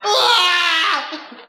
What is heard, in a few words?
scream prior warble; ooh ahh